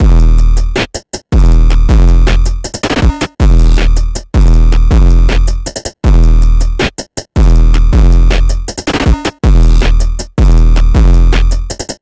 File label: Drums 8bit; Factory Expansion Pack